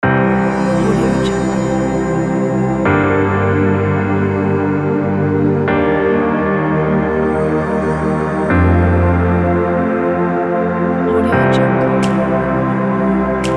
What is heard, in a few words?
atmospheric cinematic soundscape